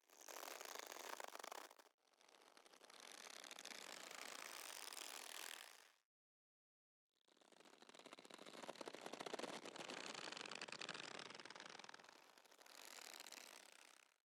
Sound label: taut rope loop; Hollow Knight: Silksong